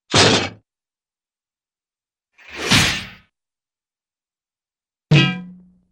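Metallic Impact with thud Whoosh Rattle Bonk, cartoon; Hollywood Edge; Cartoon Trax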